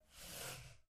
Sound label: Short Mouse Slides